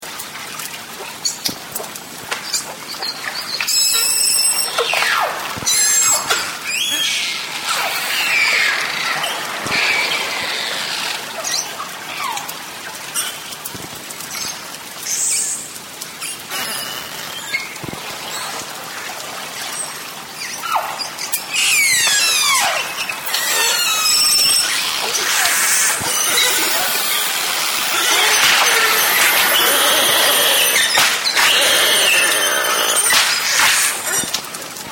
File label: beluga